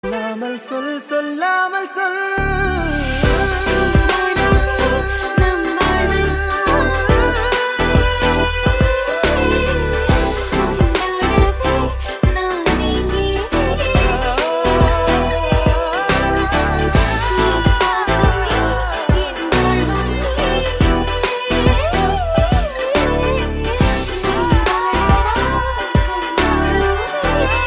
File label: Female